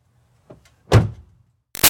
Car Highlander Door Close; 2005 Toyota Highlander V6; Door Locks; BigRoomSound

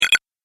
Game Power Button, video game

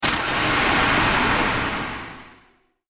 Short Impact And Explosion, bomb

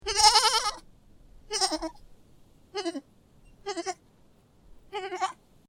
Goat Baby Goat Bleat Five Times, Young goat baah; Goats; Animals; Goat Sound Effects

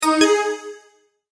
multimedia tone verified

